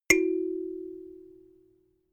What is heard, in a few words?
notification kalimba second